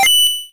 Get a coin; puchicon; dsi ds

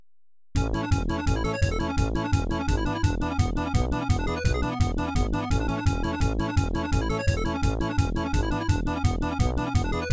Multiball (with Polka Intro); Cactus Jack's; Gottlieb; pinball; arcade

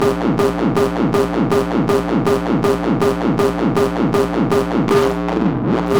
Beats Idle Loop; Anger Foot Demo; Angerfoot Beats 11 Idle Loop V2

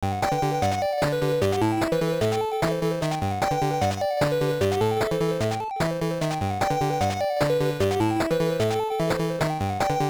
BGM; mahjong touryuumon; wonderswan

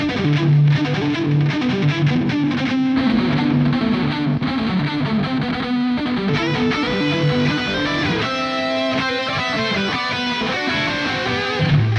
Music Down Beat Guitar; GnomeGnight

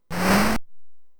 Tandy Trapdoor; Lemmings

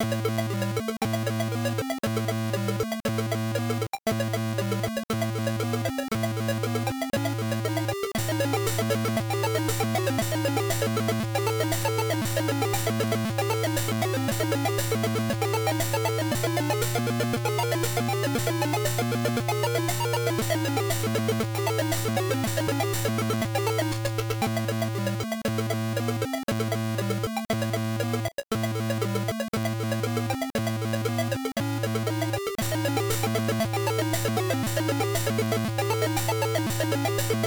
Ladder; Mortal Kombat 3; sms 1996

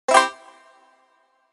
Collect Honeycomb; Banjo-Tooie

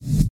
wand down stab prepare

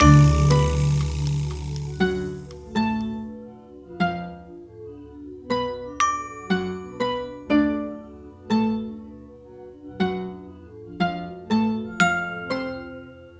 Accompaniment

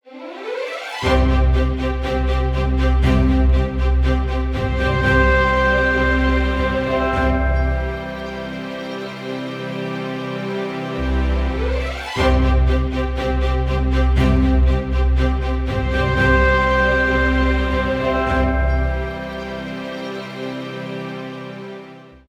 Victory Loop; Terra Alia The Language Discovery RPG

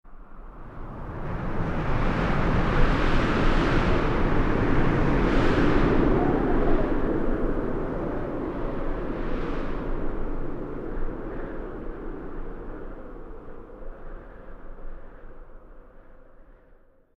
Wind Gust One Shot; the unfinished swan

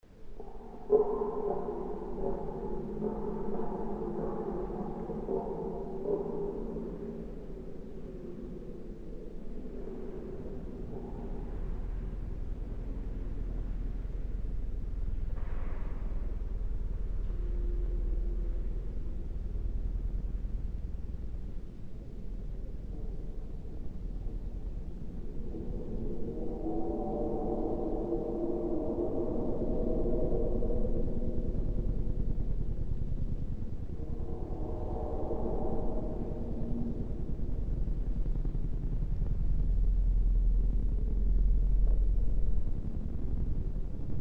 Dungeon Ambience; Idle Sword 2

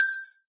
Combo Sound; candy crush saga gamerip

